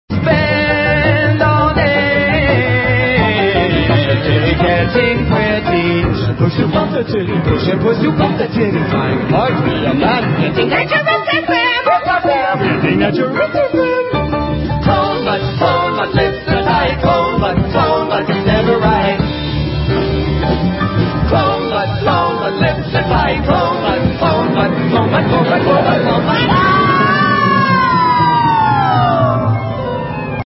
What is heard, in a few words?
lofi; broadway; clone